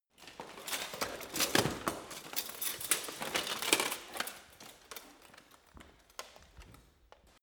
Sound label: get up